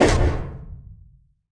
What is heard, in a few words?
ricochet